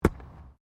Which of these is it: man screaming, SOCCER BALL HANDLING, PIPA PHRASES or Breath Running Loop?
SOCCER BALL HANDLING